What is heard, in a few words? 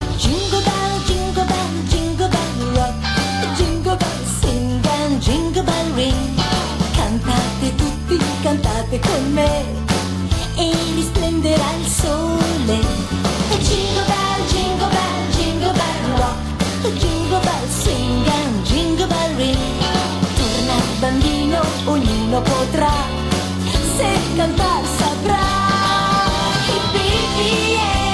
Italian Ringtone